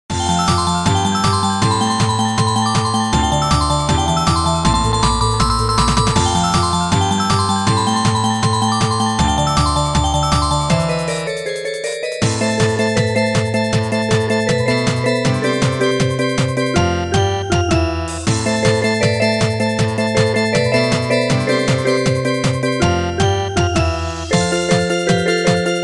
Music Box, Battle Theme; Flipside Battle Theme

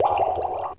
rollover; SpongeBob Diner Dash

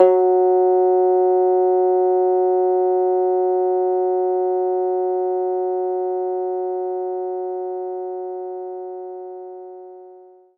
Finger Bass E0

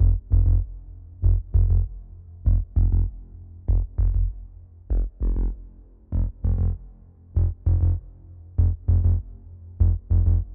Bass Soft; Get Even

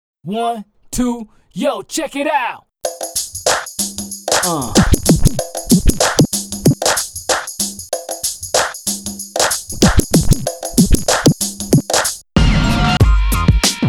scratching; crackin dj; snob

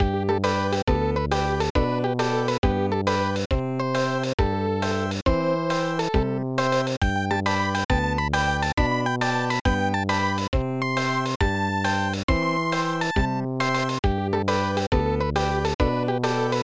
Round Music; pipe dream snes

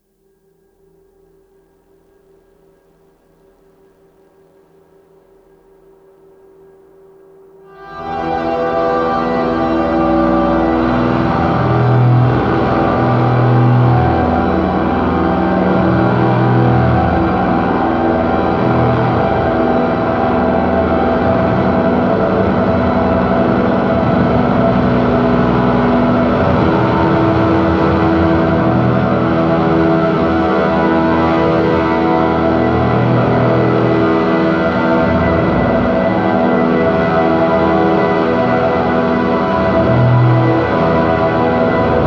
Shortwave Noisetest

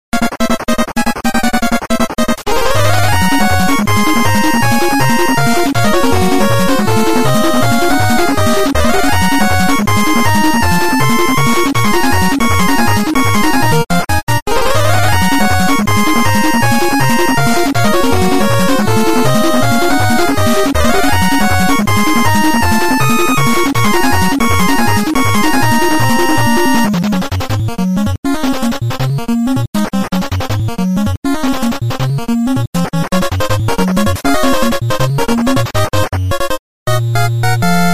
Battle 8-Bit; Ar tonelico: Knell of Ar Ciel

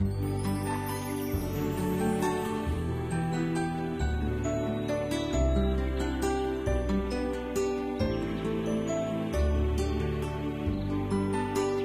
Music Score; Pet Rescue Saga; Android

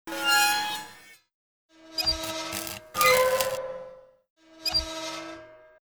lamp

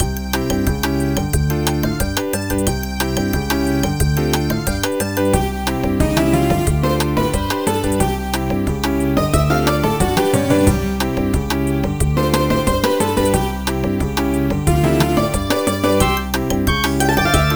Pause Menu

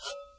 Pan Pickup; papas cupcakeria online windows gamerip 2013

